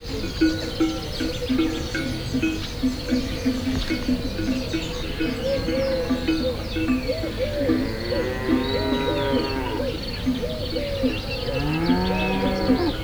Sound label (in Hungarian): napnyugta körül, szürke marha, vágtató vadló, puszta; Hortobágyi Nemzeti Park